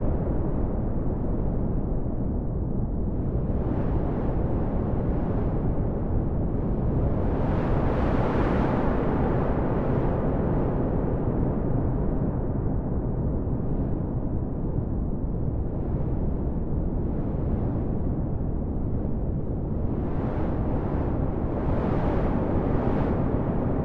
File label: Huge Planet, Rumble, Empty, Large, Space, Sci-Fi, Planet, Ambience, Exterior; 344 Audio; Alien Atmospheres